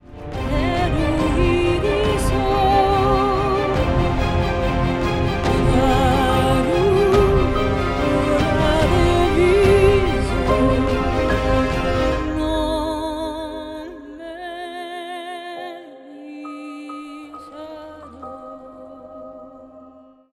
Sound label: Epic commercial